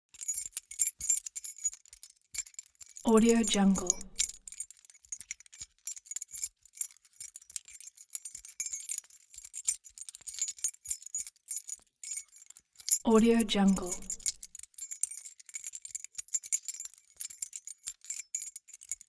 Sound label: object key key ring small rattle sequence hard